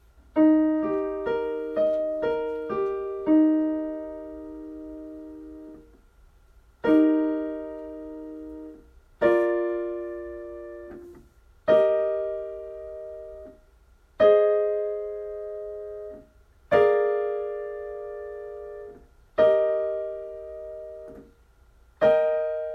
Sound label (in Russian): Аккорды